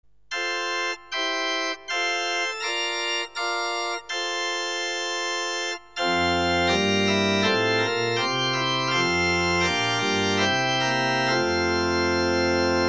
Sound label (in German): grossmix scharff, sakralorgel